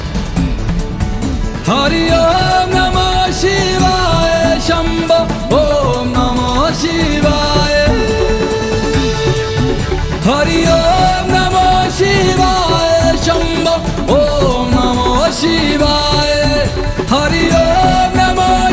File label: Hari Om Namoh Shivay Shambhoo Om Namoh Shivay ringtone